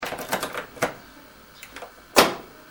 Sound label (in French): ouvrir